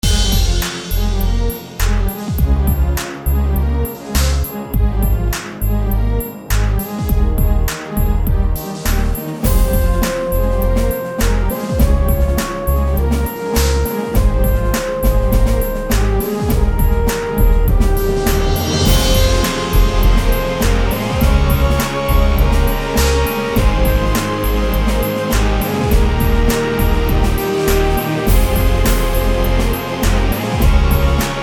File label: stadium; Manamon Music; Manamon 2